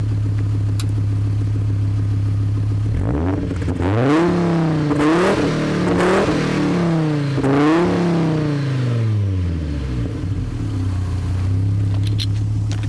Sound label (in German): 20V Sound aussen stand, sauger; tuning